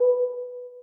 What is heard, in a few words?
incoming; Messages